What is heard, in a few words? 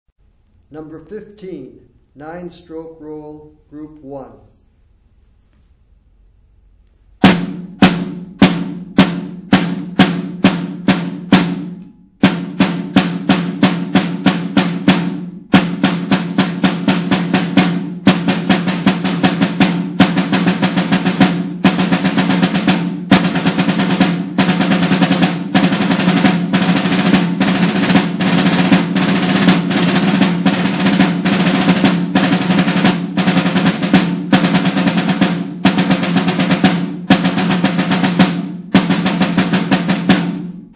snare rudiment